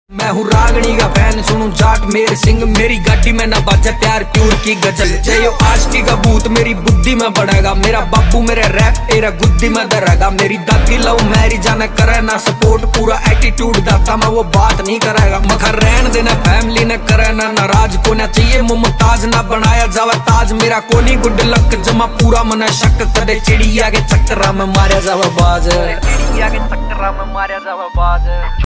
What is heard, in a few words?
haryanvi ringtone